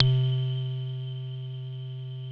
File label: SYNTH